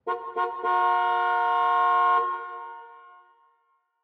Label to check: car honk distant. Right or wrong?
Right